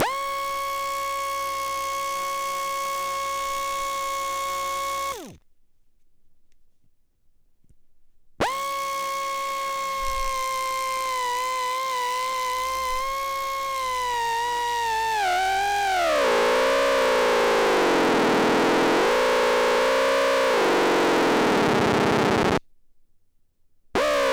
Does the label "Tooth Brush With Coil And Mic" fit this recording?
Yes